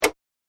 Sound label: rollover; kickbuttowski; mellowbrook mayhem